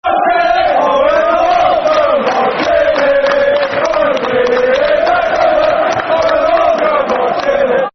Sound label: When The Wolves Go Marching In, Football Chants (Soccer Song); wolverhampton wanderers; fanchants